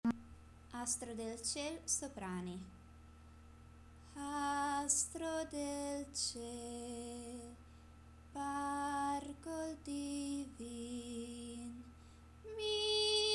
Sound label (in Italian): astro del ciel soprani; Il coro parrocchiale di Bastiglia